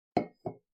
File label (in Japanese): タンブラーを置く音